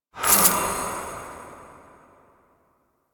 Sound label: Boon Reroll; Warframe; UI; Duviri